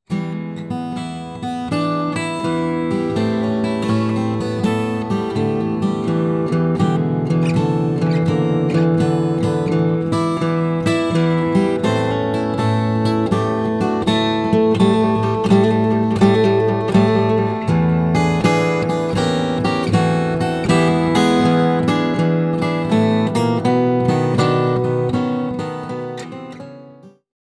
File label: blues